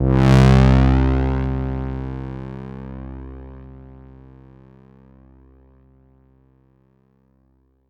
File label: C2, Synth Bass